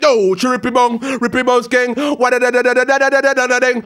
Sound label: RAGGA MUFF, B maj; Sound & Vision - Gigapack II